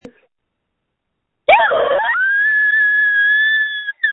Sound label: Scream